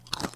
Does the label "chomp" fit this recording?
Yes